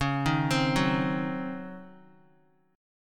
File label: Db Major 7th Suspended 2nd Suspended 4th Db Guitar; x,4,3,5,6,x; DbM7sus2sus4 arpeggiated; JGuitar